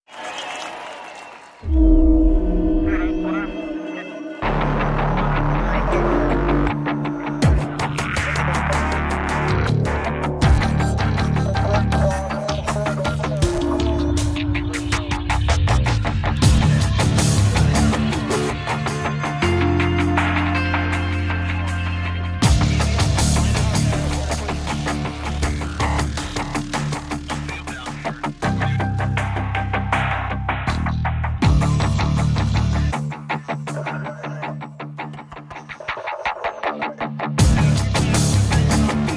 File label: Post Race Music; Need for Speed Shift